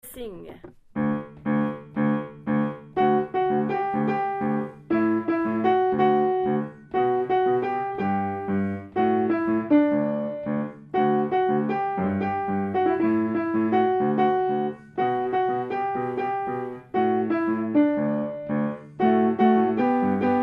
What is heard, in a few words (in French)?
accompagnement; Come let us sing